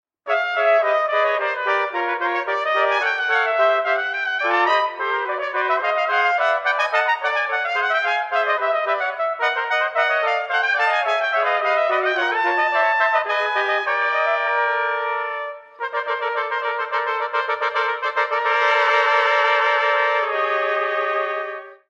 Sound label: fanfanfaren allegro; trumpets; Andy Kozar